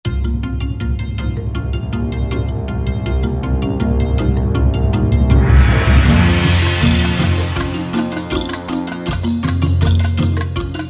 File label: Shakuhachi Percussion; Percussive